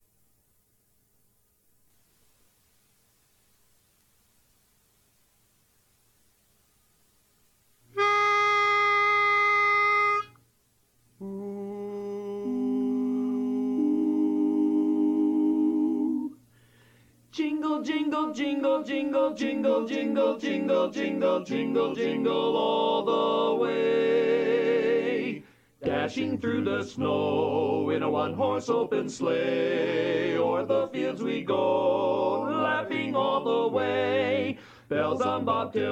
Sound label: Jingle Bells Lead